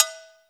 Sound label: COWBELL